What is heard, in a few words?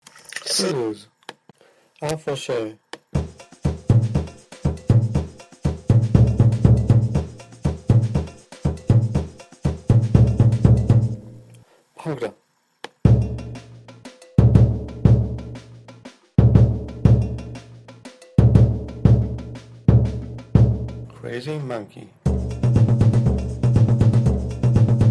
Surdos